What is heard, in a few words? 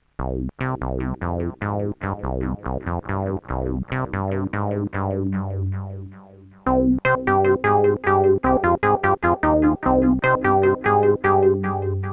SH 1000 Solo Delay